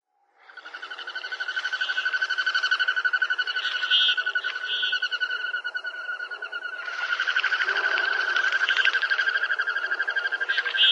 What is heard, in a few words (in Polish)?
gogol krzykliwy